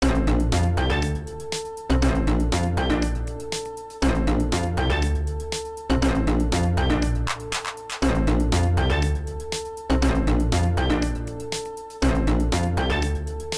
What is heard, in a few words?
Crab Funk; crab champions 2023